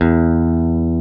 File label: Elec. Guitar E1; Proteus 2000; Digital Sound Factory Proteus 2000